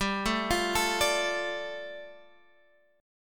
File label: G 7th Suspended 2nd, Guitar Standard; arpeggiated; JGuitar